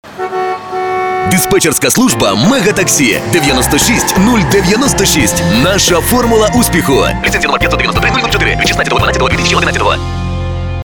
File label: ringtone